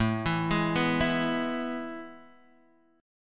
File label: A Major 7th Suspended 2nd, Guitar, Open D; chord